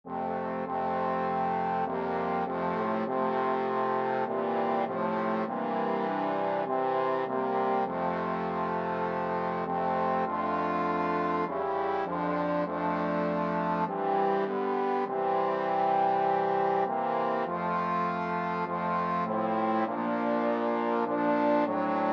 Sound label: it is well, trombone quartet